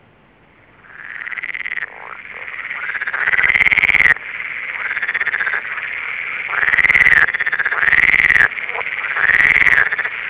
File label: esculenta, rana pelophylax; animals chordata salientia ranidae; eukaryotes